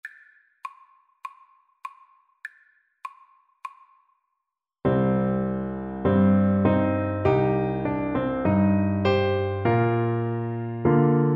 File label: horn, French Horn